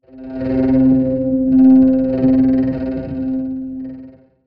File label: PAD - C; Instrument Oneshots; 99Sounds; Monosounds